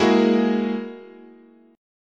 G sharp 7th Suspended 2nd Sharp 5th, chord, guitar